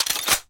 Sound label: weapon pickup; weaponweights content